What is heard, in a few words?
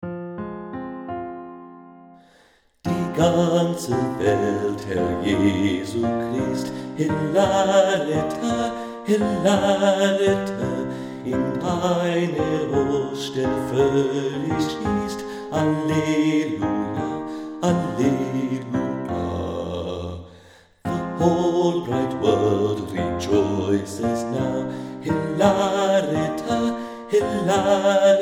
Hilariter Bass; st barts